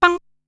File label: "Pan", Korg Wavestation-SR Drum Kit